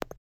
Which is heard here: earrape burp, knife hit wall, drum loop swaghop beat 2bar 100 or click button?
click button